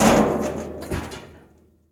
Garbage Can- Set Down, Classic Metal Trash Can Being Dropped- Hit, Close Perspective; Blind Drive